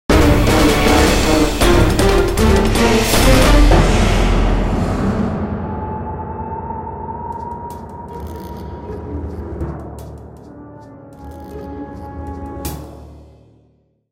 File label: Fight; Silas; The Da Vinci Code; XBOX